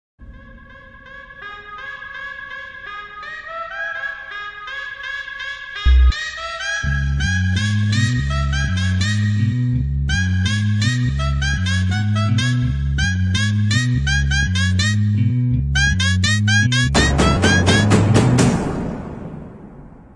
Theme Music Ringtone; Premam

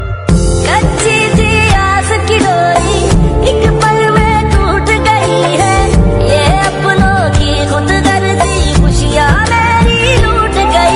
Sad Hindi Ringtone

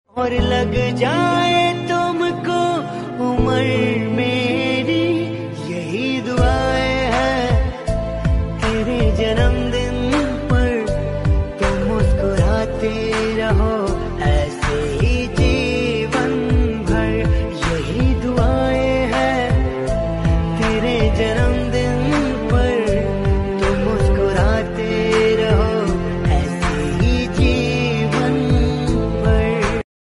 Happy Birthday Hindi Song Ringtone; ringtones

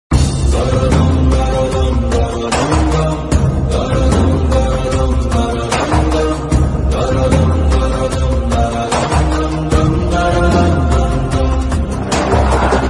BGM Ringtone; Rrr Friendship

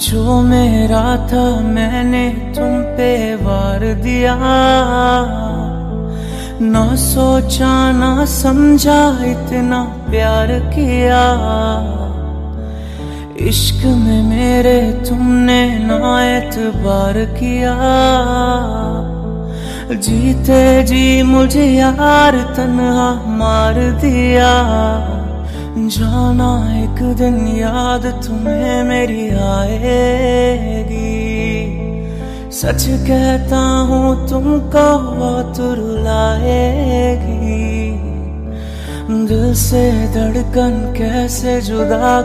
Sad Ringtone

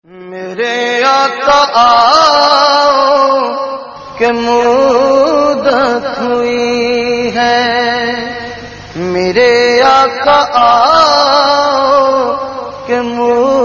Islami Naat Ringtone

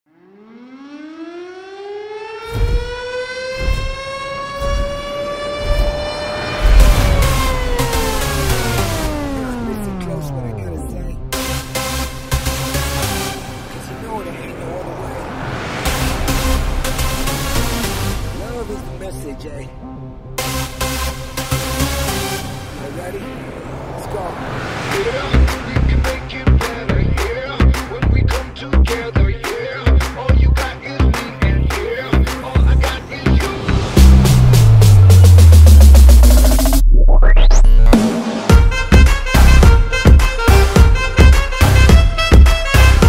Only Music Ringtone

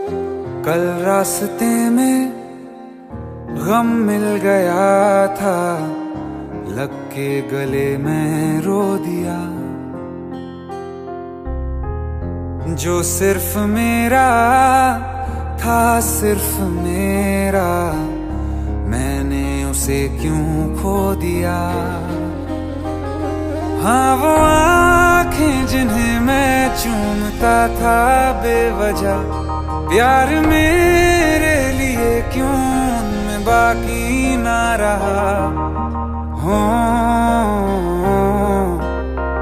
Sad Ringtone

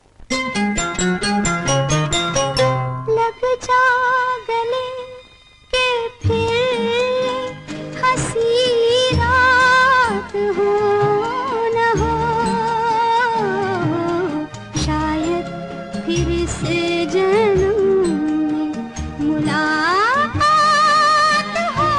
Bollywood Romantic Old Hindi Song

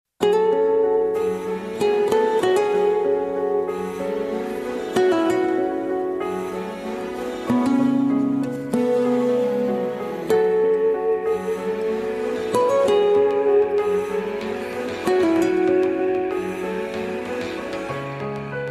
Nice Music Ringtone

